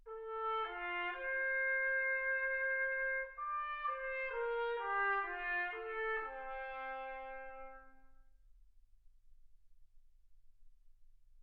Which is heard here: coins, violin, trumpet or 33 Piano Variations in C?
trumpet